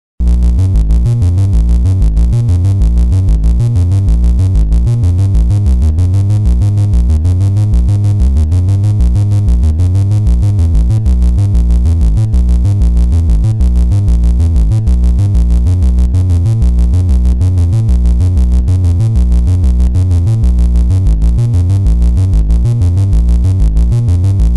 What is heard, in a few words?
analog synthesizers sequencers; dr zee workshop